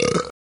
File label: Burp Human